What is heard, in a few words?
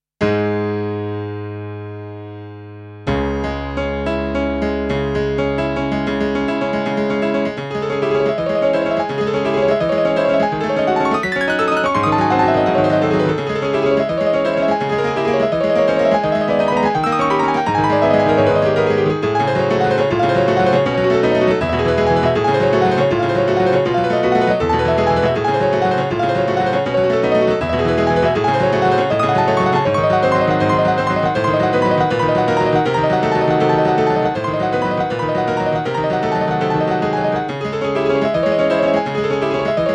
Piano